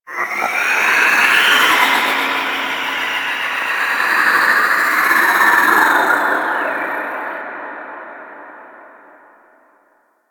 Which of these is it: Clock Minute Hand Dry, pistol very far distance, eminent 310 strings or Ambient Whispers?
Ambient Whispers